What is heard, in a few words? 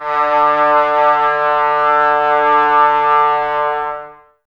brass, Tbs Mute